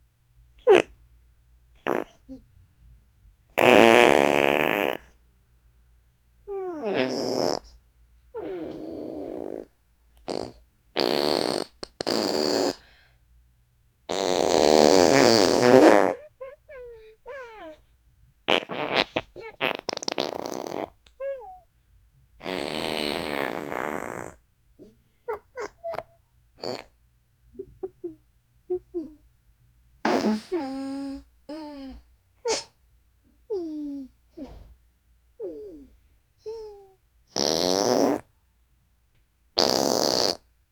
fart sounds farting fart; gag

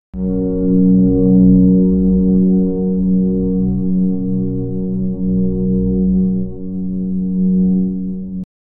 wavetable pad warm analog, drone

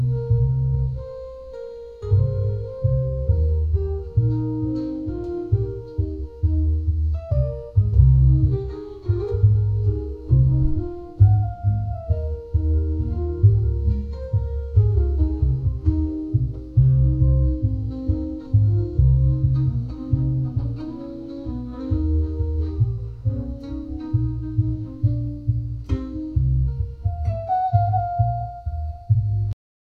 muffled distant jazz club; nightclub

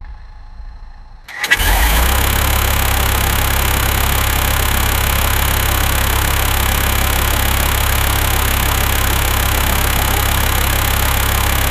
nissan 350z v6 engine sound; automobiles

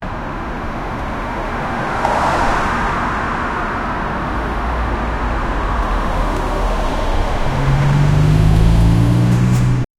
city traffic ambience

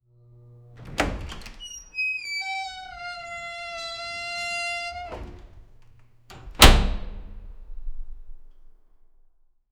doors

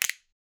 short plastic snap sound

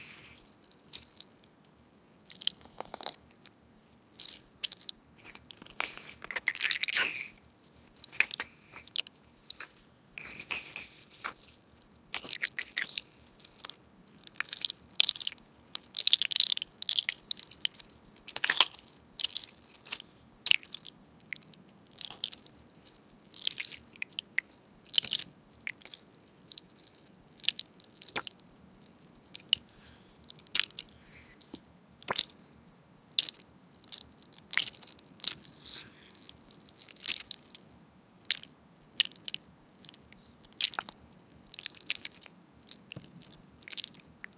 peeing, toilet

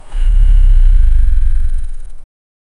celestial fox collision a, cracking

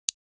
sound click button

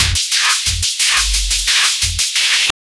place ding, competition

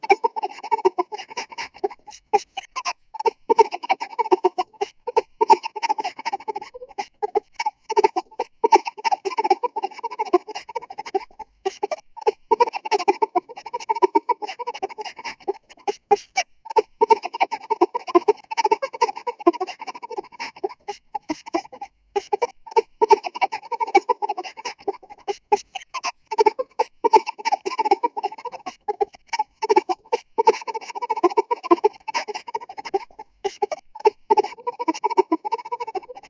continuous, baby

